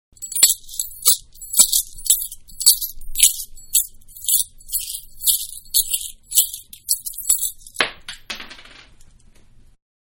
Coin Several-Toss In Hand; Money; Objects; Manhattan Production Music

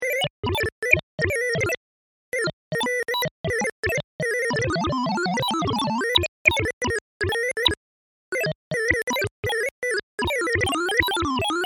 Electronic Data Bleeps Loop; Beep Sound Effects

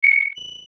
Beep Multiple Flutter Vibrato; Production Element Imaging Element Accent Transition; Multimedia; Beeps

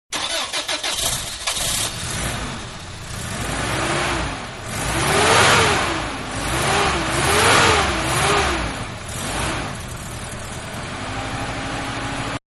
Mid Size Car Start Up Accelerate, Exterior; Manhattan Production Music

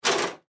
Dog In Cage, Dog In Cage Hit Wall Rattle; Animal; Dog Sound Effects

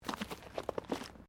JUNK, Debris; Material components for constructing more complex sounds; Materials

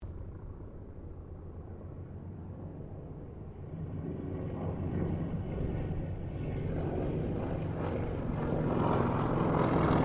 AIRCRAFT TROJAN SQUADRON 4 PASS

